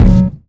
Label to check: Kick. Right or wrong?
Right